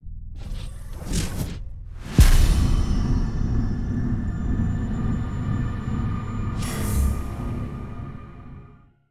Reflection Barrier; Badger

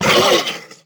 Monster Attack; Monster Lizzie Attack

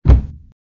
Thud

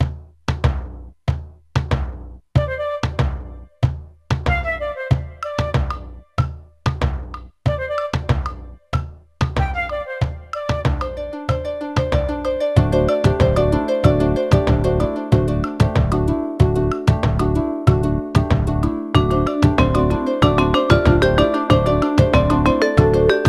music forest, bpm94; Donkey Kong Country Returns HD

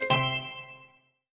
Out Event Gain

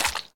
In Obstacle Pineapple Removal

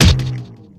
Universal Fall; Superbrawl; Nickelodeon Super Brawl Universe; Android

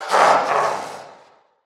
Finalboss Vox Taunt

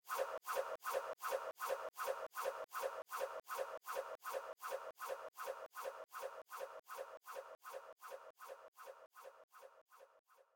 Card Pack

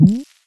Portal Barrier Collide

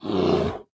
Grizzly Bear; gamerip

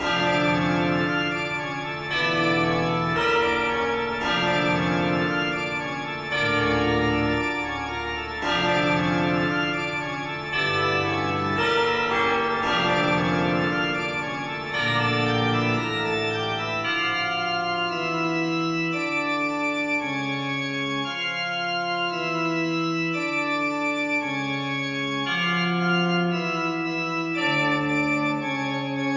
Loop

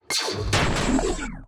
Final Boss Balls Movement

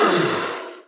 Magician Damage; Folk Hero

